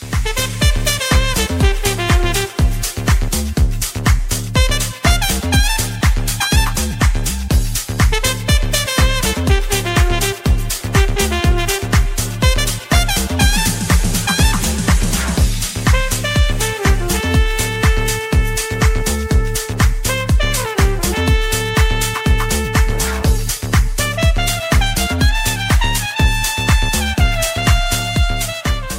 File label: Trumpet, ringtone